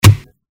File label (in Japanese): 矢が木に刺さる; モノラル